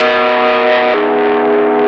Loud lofi guitar

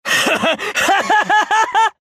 Hahahaha

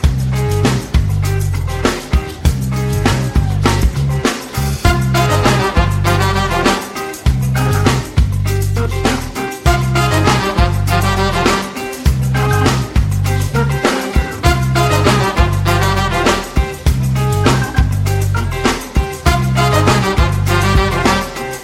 Balkan Wedding

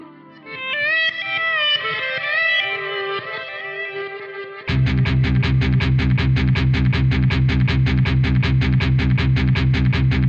Flip Flop Ringtone

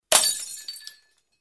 Glass Broken

